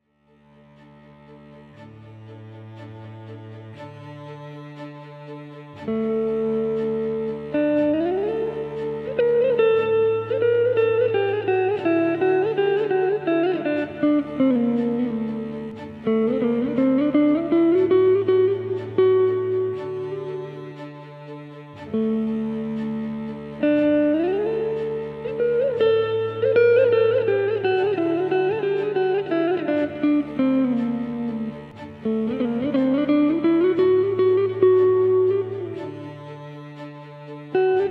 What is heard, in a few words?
Symphony in Raga Charukesi